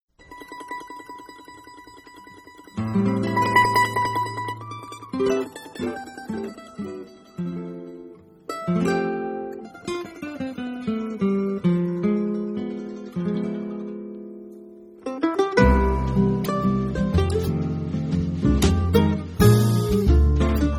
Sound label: groove